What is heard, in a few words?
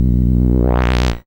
SID Bass B, chip; CHIPSHOP HITS